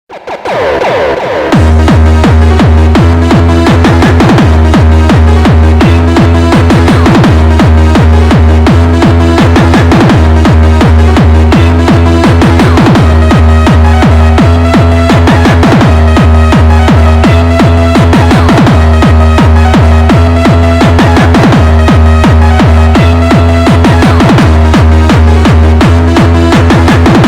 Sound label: speed up